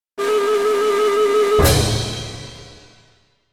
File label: Circus Jumps With Clarine